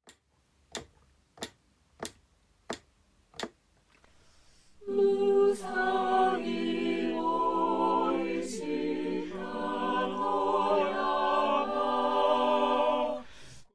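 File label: precount a cappella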